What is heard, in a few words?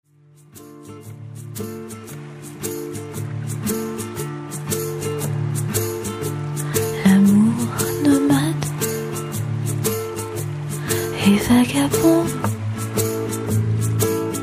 French Cafe